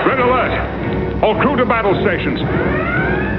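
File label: red alert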